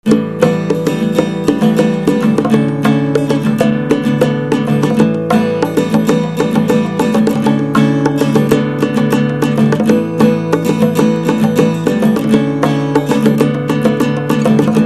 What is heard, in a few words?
trumpet